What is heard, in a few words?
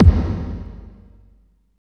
KICK, Tekno Industrial; East West